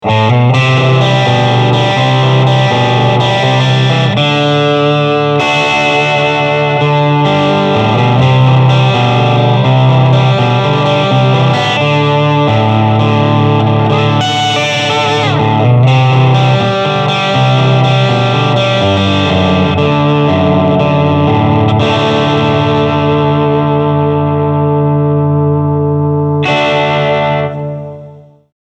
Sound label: blackface